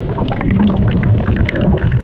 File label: thick liquid pouring with deep glugs; water